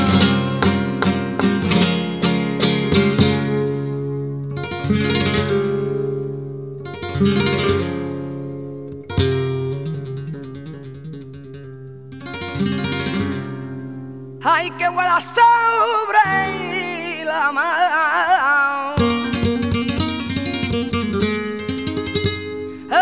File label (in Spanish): Minera, flamenco; del Pajarito